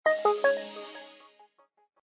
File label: Shut down; LG; GM630